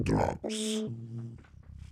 drums, demonic techno voice drums 125 bpm

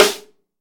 treble heavy acoustic snare sample g sharp key; snare drum samples

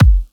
clean kick drum g key

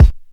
dope kick drum single hit b key

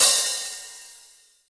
old school crash cymbal single hit d sharp key; drum sample

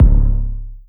nice bass drum one shot c sharp key; kick drum samples

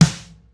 snare one shot e key

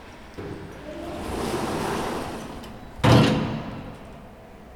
elevator door opening natural hallway echo

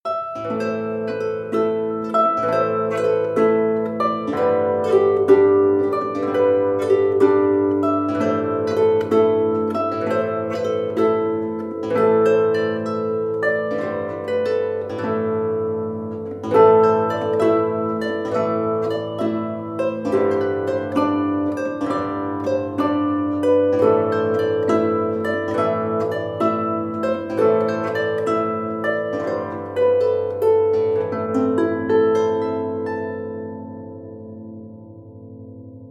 natural harp traditional sequence; loops; titan

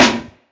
acoustic snare sound a sharp key; snare drum samples

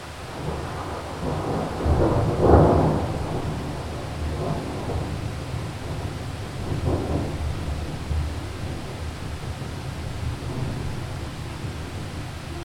mountain thunder roll with heavy rain

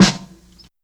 round steel snare drum f sharp key

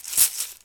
acoustic shaker one shot; percussion

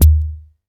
silky kick sound D# key; kick drum

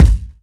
kick one shot g sharp key

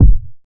dope bass drum sound d sharp key; kick drum samples

